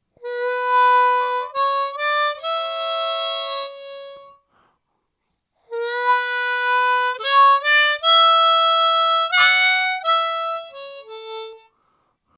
Harp Excerpt, Harmonica; Verdigris Music

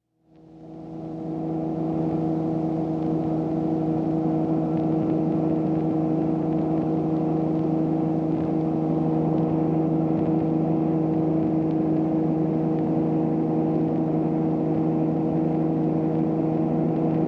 Helicopter Interior

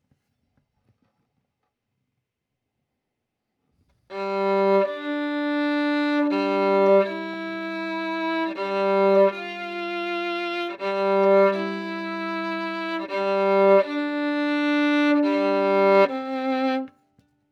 Violin

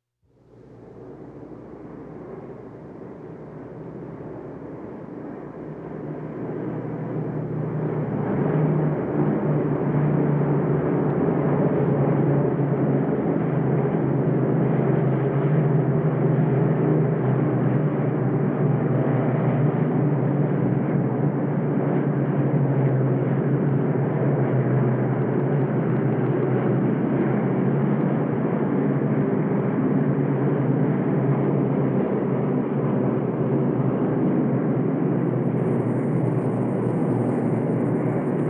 Bombers in Flight

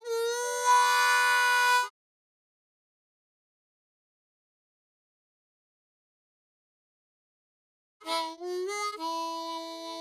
Harp Excerpt

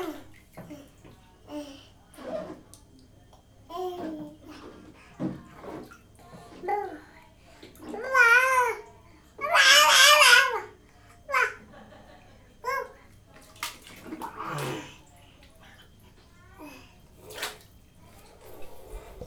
Baby in bath

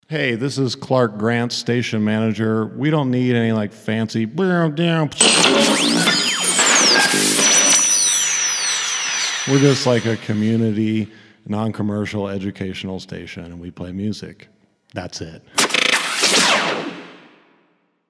ID Music; STATION IDs

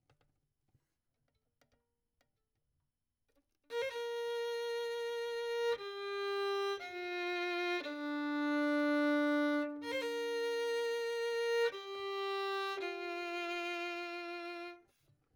Violin; Verdigris Music

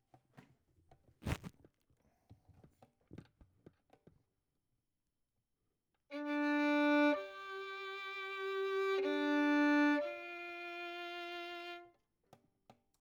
Violin; Verdigris Music

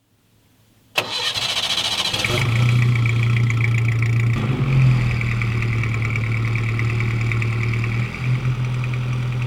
Engine Sound

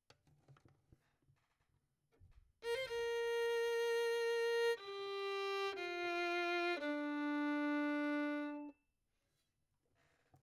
Violin; Verdigris Music

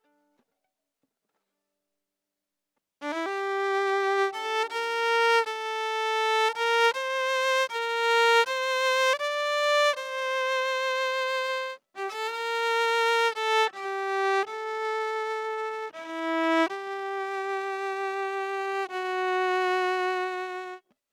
Violin; Verdigris Music

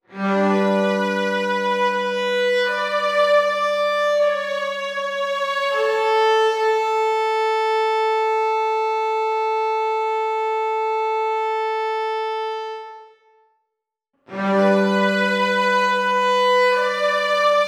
Violin; Verdigris Music

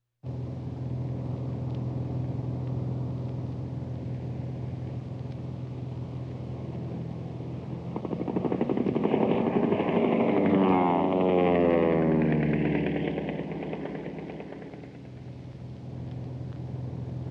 Fighter Plane in Action

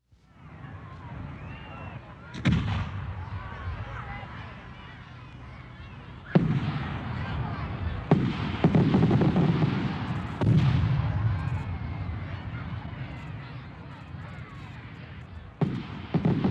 Fireworks with Crowd

